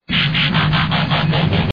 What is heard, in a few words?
Transform Down